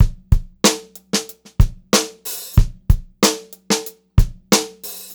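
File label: STRAIGHT BEAT, Funk-Groove Drumloops; USB Soundscan vol.38; AKAI; 90 s Sample CDs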